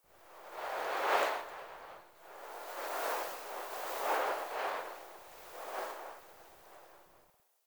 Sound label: wind gust; Warframe; Eidolon Plains; Ambience